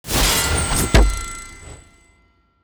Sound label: scatter Unveil Dagger; netent